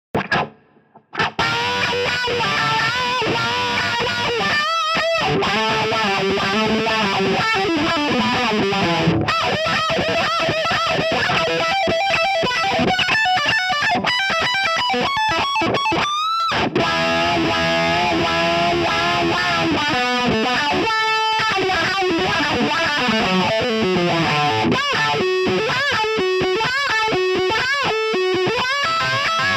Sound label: wah